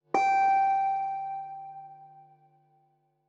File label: step; Garry's Mod; poppf electrodactyl